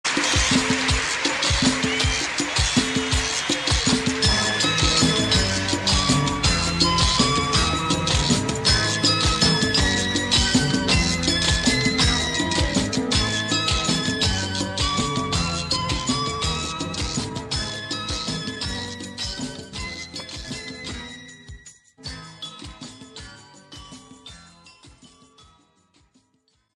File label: Instrumental